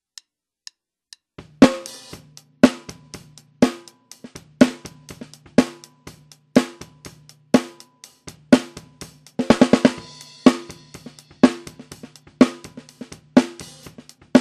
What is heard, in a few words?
High Mute